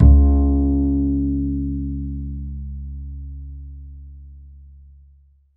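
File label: double bass C#2; Univers Sons; Jazzistic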